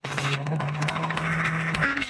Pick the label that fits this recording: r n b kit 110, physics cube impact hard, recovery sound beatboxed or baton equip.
recovery sound beatboxed